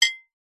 household crockery ceramic hit with fork muted ping; zapsplat